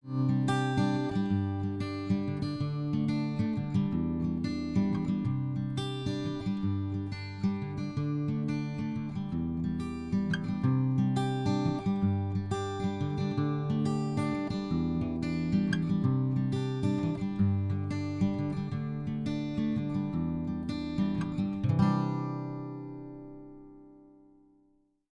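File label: P87 picked guitar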